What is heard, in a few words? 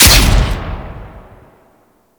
Fire, Rifles; Snipetron